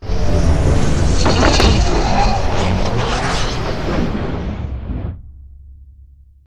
strafe close; Beacon; Titanfall 2

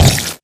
imp bullet lightballistic shieldwall; Titanfall 2

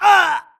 grunt death neck; Titanfall 2

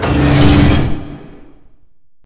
sliding doors open slide; beacon; Titanfall 2

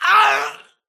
grunt death crush; Titanfall 2

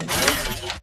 Stalker Mvmt Servo Damaged Light Medium; Titanfall 2

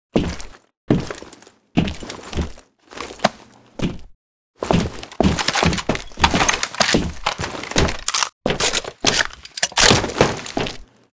weapon toss right; Titanfall 2

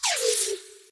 imp bullet generic titanshield; Titanfall 2

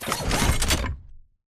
combat run to idle forward; Titanfall 2

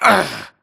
grunt hits; Titanfall 2

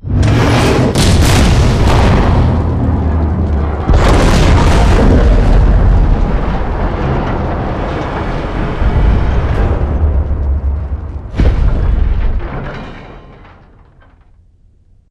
dog whistle explosion; boneyard; Titanfall 2

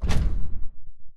atlas footstep jog; Titanfall 2

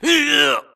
grunt electro; Titanfall 2

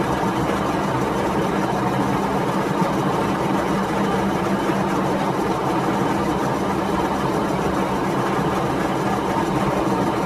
Prop Warehouse Prop Rack Move Dist; Titanfall 2; Boomtown

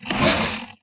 Mvmt Servo Light Short; AI Zombie Stalker; Titanfall 2

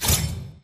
Notification Right Slide In; HUD; Titanfall 2

